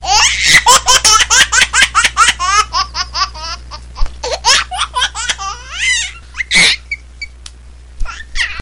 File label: baby funny